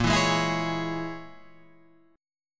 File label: A sharp Minor 7th Flat 5th A sharp, Guitar Drop D